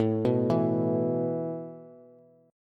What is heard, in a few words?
A Suspended 2nd, Bass; Asus2; arpeggiated; x,12,9,9; JGuitar